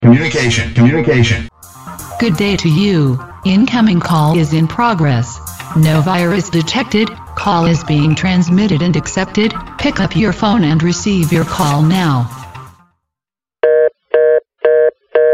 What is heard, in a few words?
Incoming Call